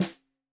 Snare 65 Reg Stereo, Deeply Sampled Drums

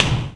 IMPACT, percussive

